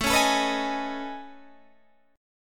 A Major Sharp 11th, Guitar, Standard; AM#11 strummed; capo=3

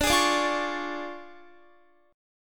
D Minor Major 7th Flat 5th, D, Guitar; DmM7b5; strummed

